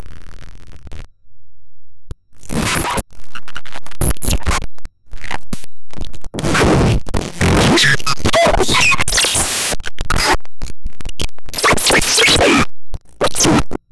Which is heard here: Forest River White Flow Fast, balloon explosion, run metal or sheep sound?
sheep sound